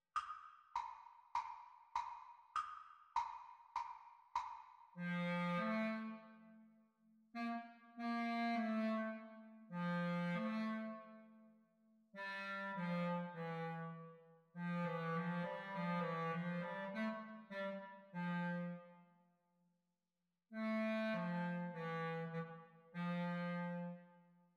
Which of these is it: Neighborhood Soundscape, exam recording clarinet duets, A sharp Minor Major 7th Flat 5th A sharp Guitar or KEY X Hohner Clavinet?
exam recording clarinet duets